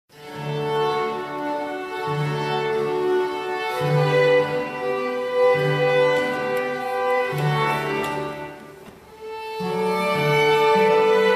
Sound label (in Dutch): Stabat Mater duet quando corpus morietur e amen sopraan; N. Logroscino